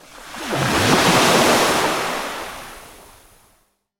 gentle wave break

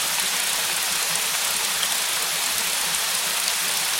window rain splatter

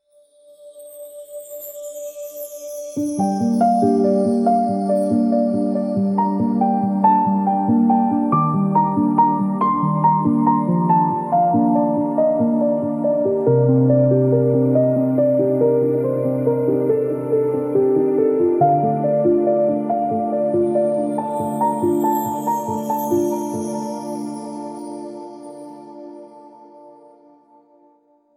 dreamy ethereal drift

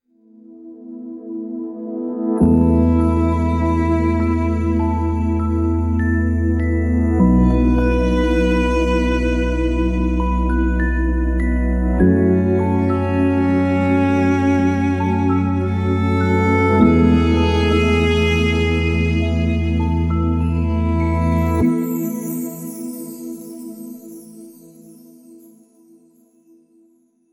warm synth embrace

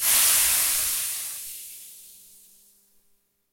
ember water sizzle

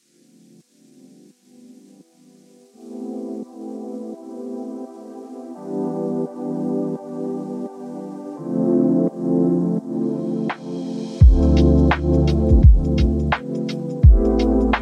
digital haze

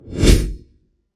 quick dry whoosh

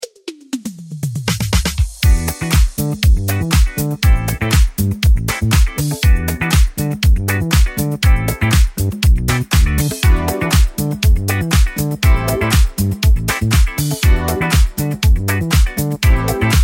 funktronic groove

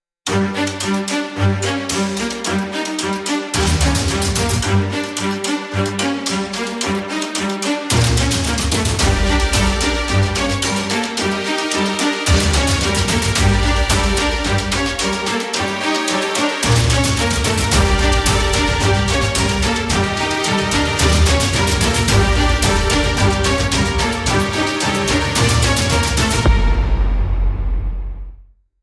confrontation

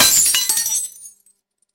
glass shatter sharp

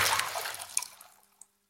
puddle raindrop

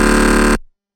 error buzzer short

